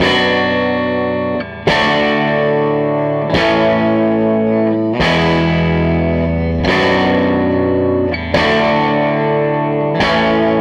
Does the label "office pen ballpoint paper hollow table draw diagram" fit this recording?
No